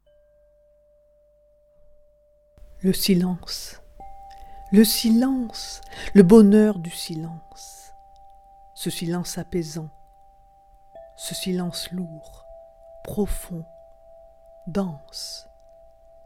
silence et harpe

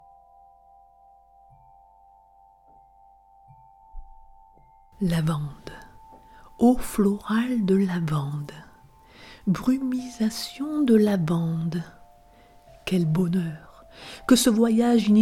lavande harpe

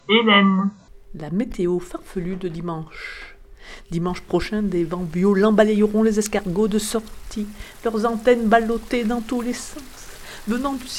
meteo farfelue vent pluie froid escargots; Studio ecole meteo

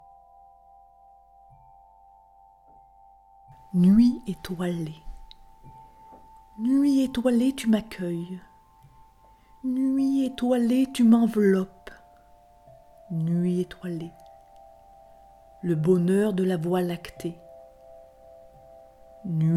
nuit etoilee harpe

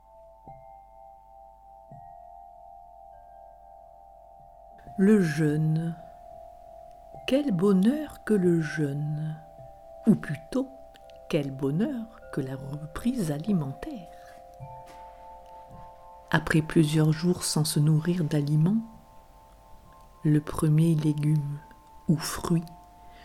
le jeune harpe

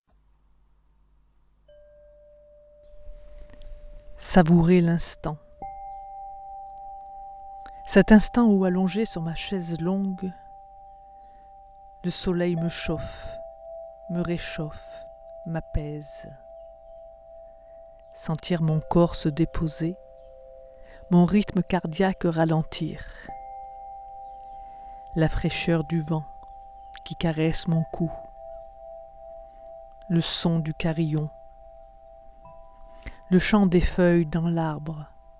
harpe

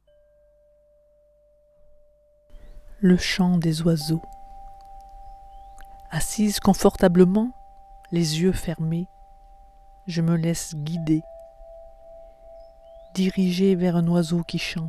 le chant des oiseaux harpe

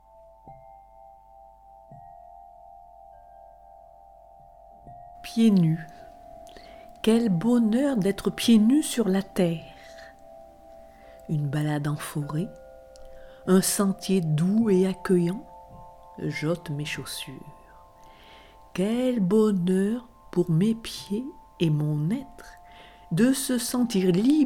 harpe

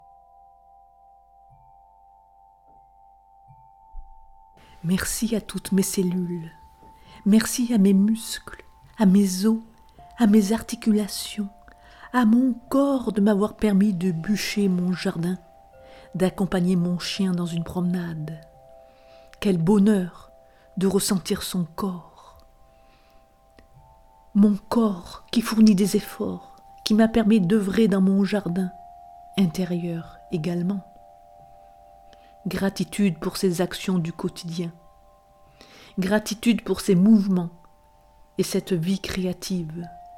mon corps harpe

